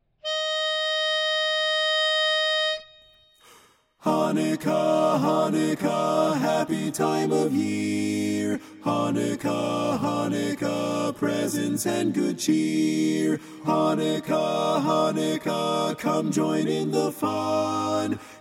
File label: TENOR, Chanukah, Chanukah